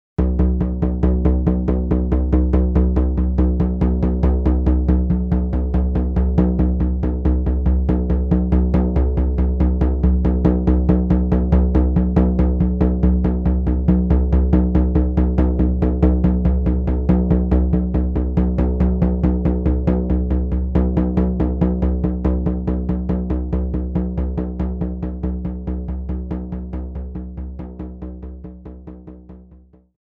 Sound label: Theta Drumming